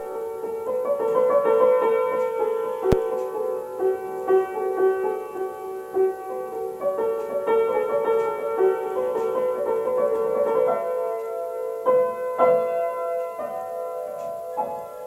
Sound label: playing on the piano even if it needs tuning; lovely to have my son home